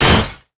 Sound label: metal medium bash metal